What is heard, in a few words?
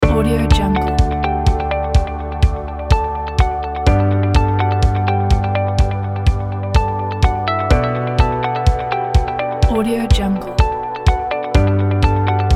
uplifting motivational